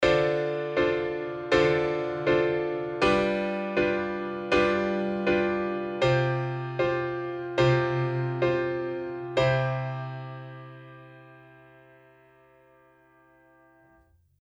ii v i sus4 i

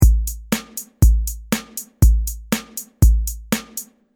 Drum Sound

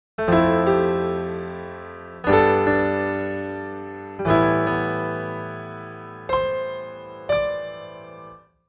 Piano Phrase

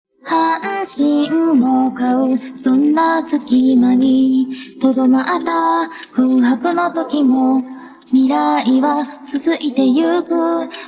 vocal

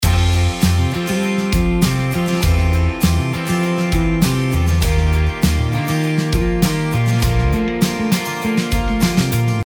a guitar pre

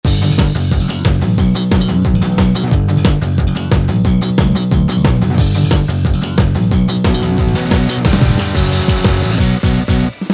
Ample PC

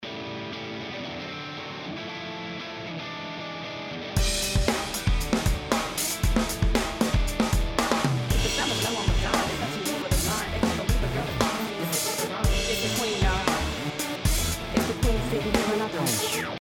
timestretch arrange

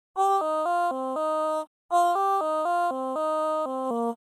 Robo Flat

